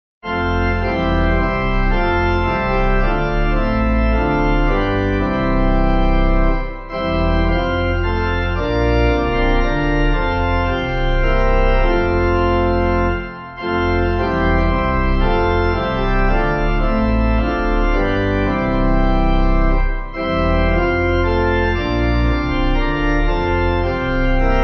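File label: Pipe Organ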